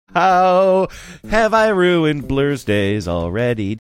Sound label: singing how have i ruined blursdays already